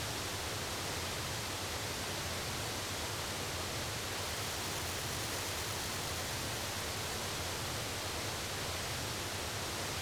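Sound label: Ambience enter atlance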